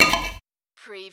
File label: Button Sound - Metal Scrape